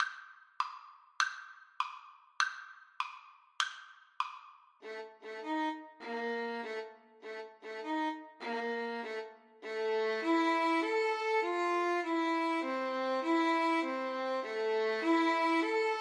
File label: violin duet